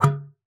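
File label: C#4, bass flute; Xsample VOL-2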